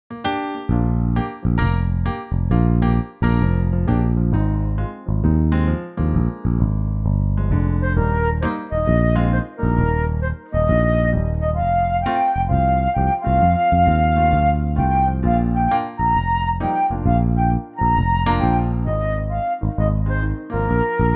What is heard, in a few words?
backing